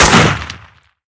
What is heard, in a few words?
shoot